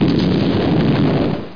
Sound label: expl big